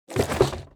ad box wobble; talking tom gold run gamerip 2016

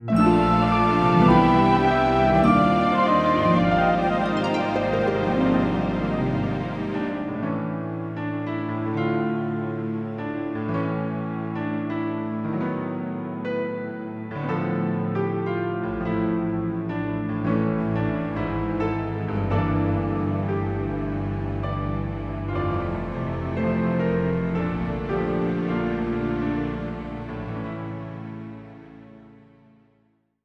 Failed; eikan wa kimi ni koushien he no michi

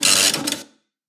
Deployment Patrol Un Assign

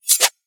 Use Knife

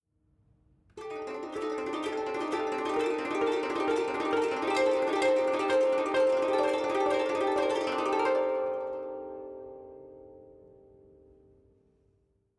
Savannah Music

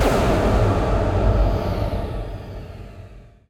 Hi Hit

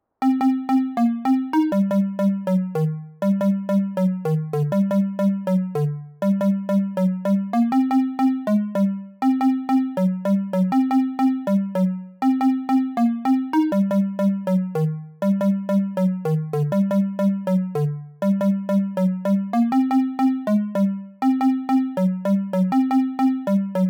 Melody; chippy

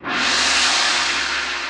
Stage up; Ninja Rush Super Running Adventure; android

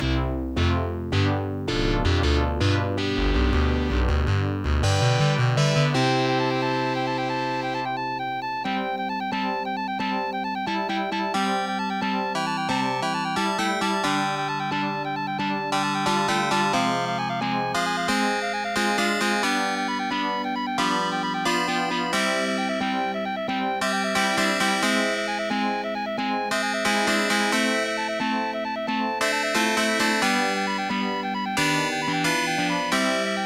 All Clear; Capcom Game Music Volume 1

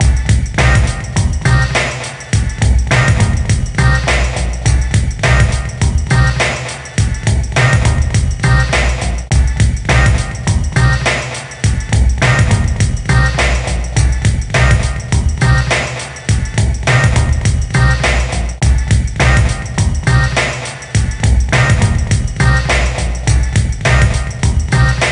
Ditty; A Building Full of Cats

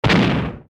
Blow

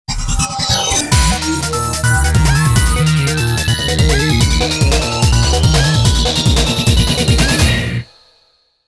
Lose (Goal Jingle); Initial D Arcade Stage; SEGA original tracks

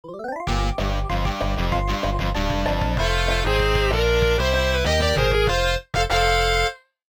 Desert Outro; cars supercharged leapster gamerip 2007

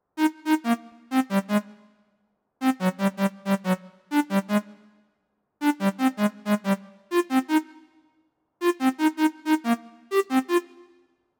Melody; chippy

